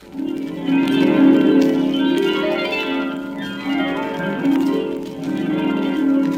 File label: harp magic wand